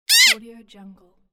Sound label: cartoon minion short yell; applehillstudios